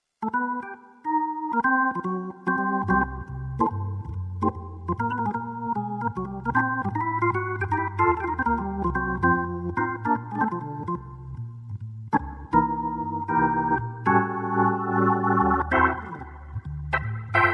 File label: nord organ